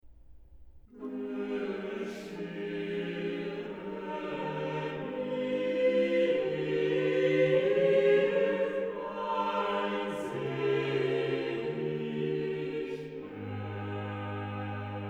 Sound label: Chorale, organ; BWV0431; Wenn dir in h chsten N ten sein; BACH; BACH ORGAN WORKS; CHORALE PRELUDES